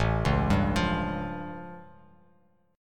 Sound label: A Augmented 7th A Bass Standard